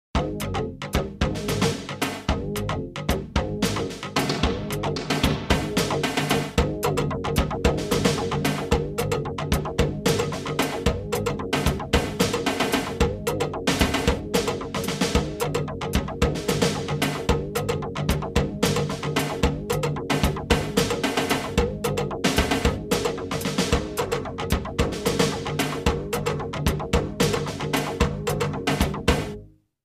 Jingles; 90 DDD Jingles

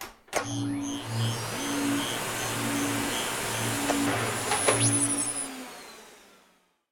garbage; firefight

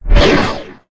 Pickup Scifi Energy

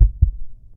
heartbeat short; RadwinIsland

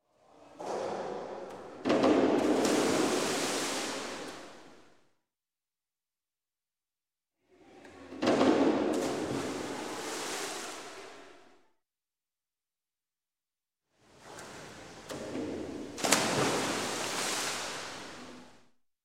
Swimming Pool; Sound Ideas 2000 Series

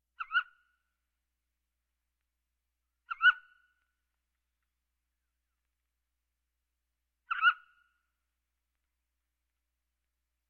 Birds; Valentino Production Sound Effects Library CD50

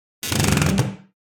Door - Wood Creak Heavy; Hollywood Edge; sound designer's toolkit v2

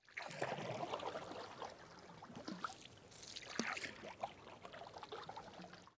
Life Boat

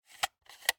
Viewmaster, plastic, lever, load release; Eiravaein Works; Vaeyan IV

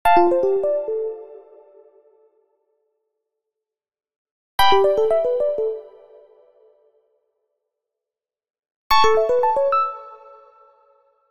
Arcade Jingle Positive

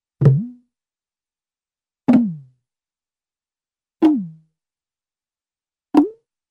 Small Hollow Drum Hits (ie Bounce), cartoon; Hollywood Edge; Cartoon Trax

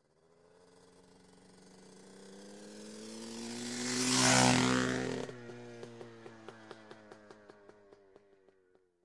Motorcycle, Dirt Bike Pass By, Medium; Sound Ideas; Series 2000

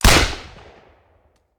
Machine Gun; Sound Ideas; Guns

